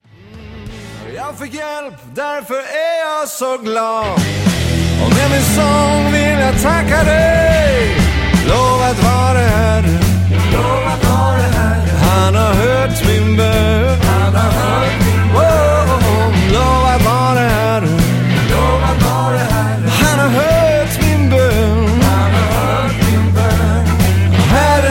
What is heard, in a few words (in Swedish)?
Lovsangsblues